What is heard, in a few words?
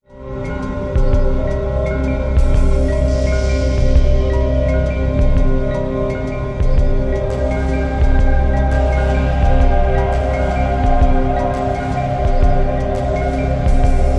Beat